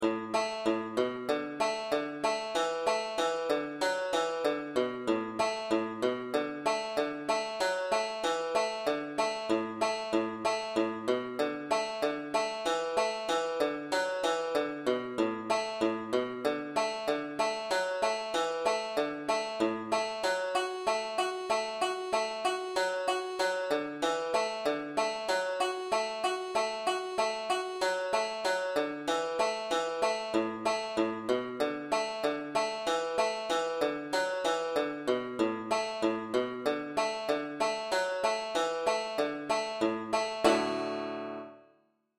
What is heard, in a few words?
irish banjo d solo; brethren we have met